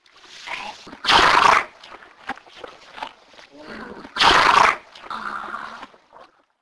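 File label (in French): cheval; Cheval 001 (éternuement)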